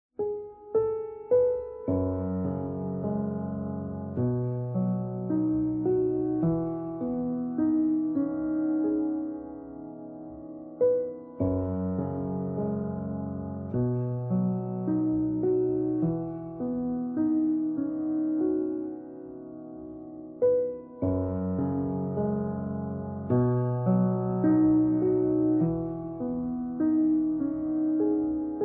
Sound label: Piano cover; ANIMAL CROSSING： New Leaf